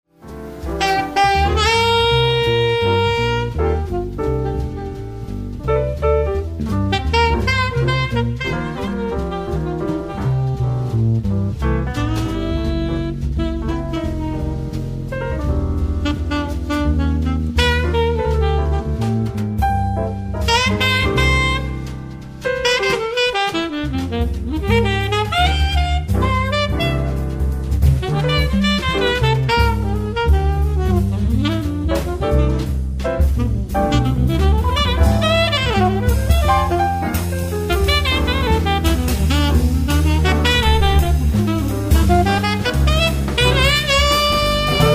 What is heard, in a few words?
Quartet